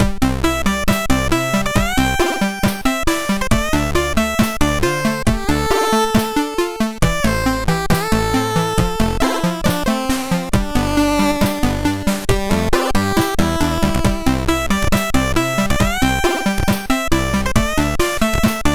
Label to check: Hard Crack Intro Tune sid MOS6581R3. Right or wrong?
Right